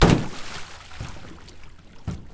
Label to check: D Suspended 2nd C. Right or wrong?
Wrong